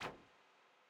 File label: swing; gnome-control-center